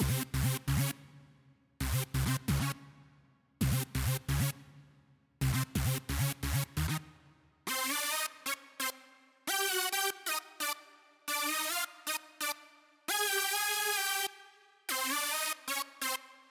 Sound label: Breakout Doom Mix Stem Layer; Breakout Recharged